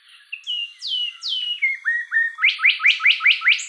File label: wren, thryothorus pleurostictus; bosque seco